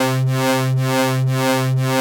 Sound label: wave loop